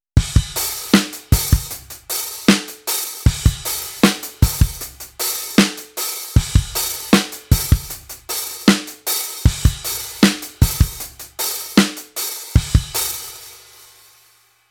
HOFA IQ Series Transient Dry

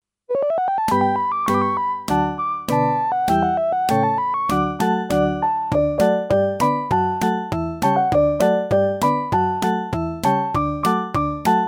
casio sk 10

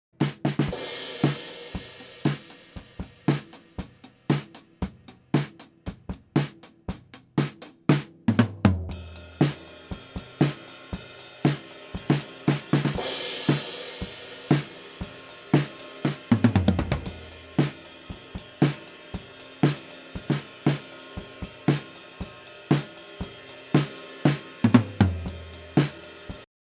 XY Drum Overhead; Oktava MK012

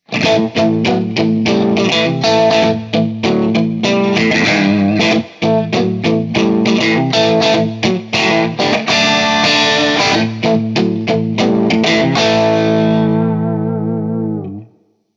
Artisan CH1 Hi Input Vol half Bass Shape 1

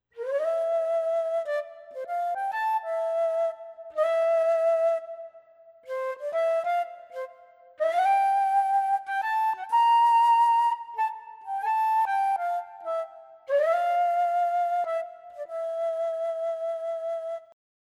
Super Articulation Flute; Tyros5

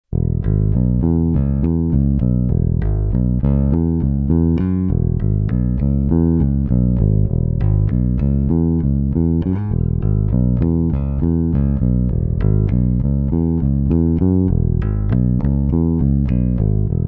EQ neutral P Pickup

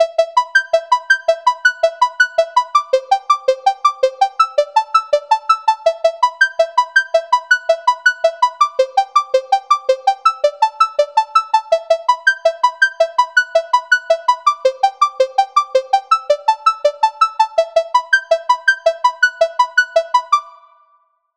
Arpeggio

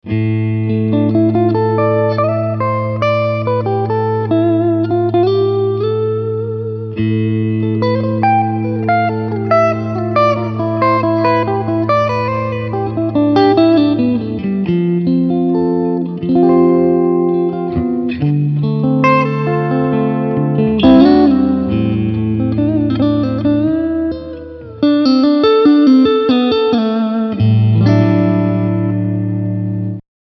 Clean Picking

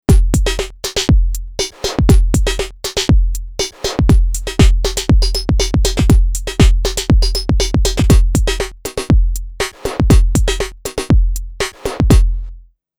Metal Noise

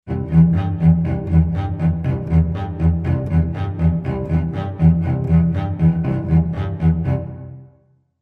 Arpeggios Cello

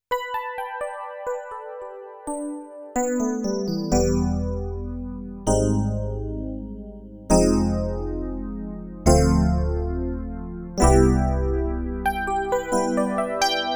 EPiano; CLP470

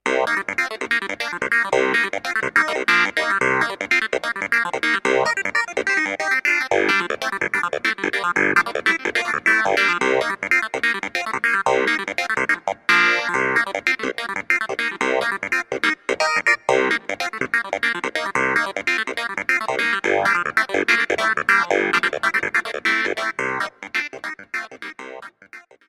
Clav

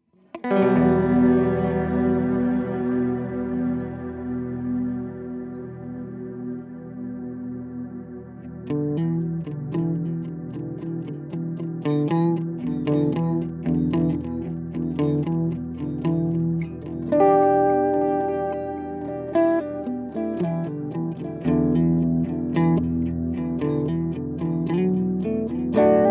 Walrus Audio Sloeer Dream Sine Sustain

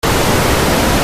Noise

